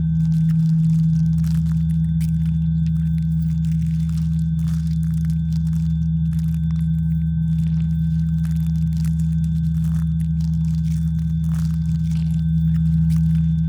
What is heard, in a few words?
pro ship science idle; biogenesis